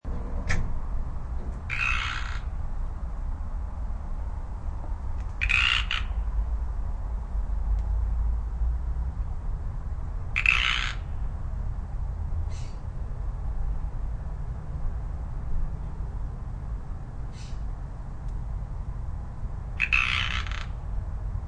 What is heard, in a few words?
tree frog